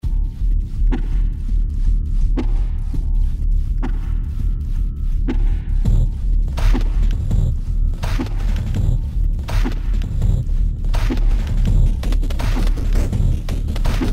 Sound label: Chase-style glitchcore tracks